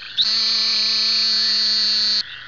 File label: great plains narrowmouth toad call; texas nature trackers; amphibian watch